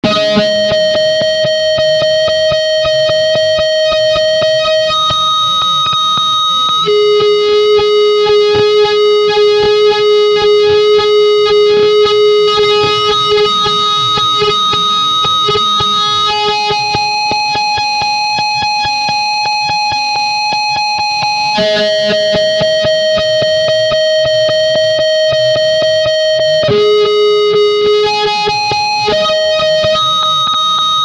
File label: Flanger Knob; Effect of delay time on feedback